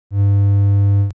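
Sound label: triangle 100, standard waveforms; praat; understanding waveforms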